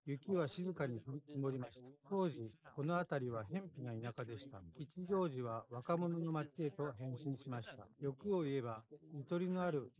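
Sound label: male, instantaneous mixture; SiSEC11; underdetermined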